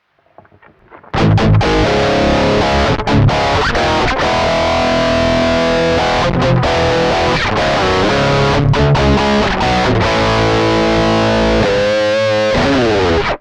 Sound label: Max Treble; sansamp